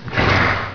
Breath, Dragon sounds; Unreal

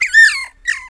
hurt, Spinner

